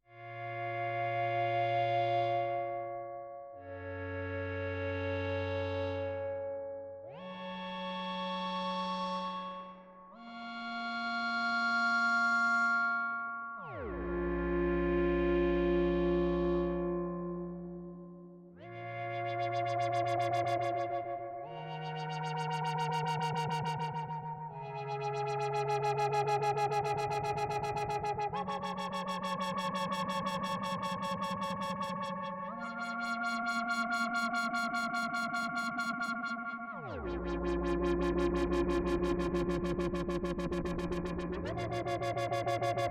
MOOG MATRIARCH Subharmonisch

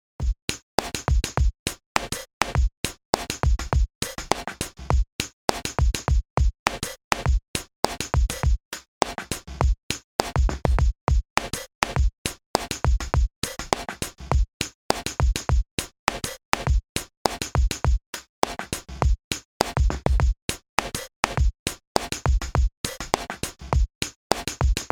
Drumloop ohne Lookahead

